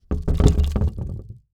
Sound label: Foley Wood Rattle Short